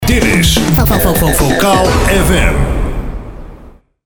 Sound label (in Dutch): Jingle Vocaal; Arkan Radio; Vocaal Fm